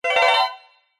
multimedia game sound short fast stuttering tone positive